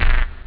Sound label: danger, synth bass